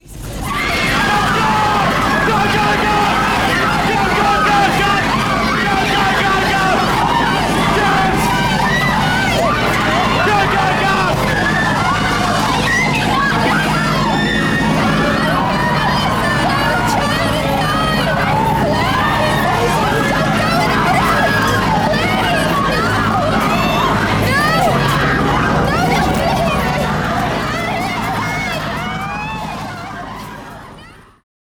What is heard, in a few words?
Hellicopter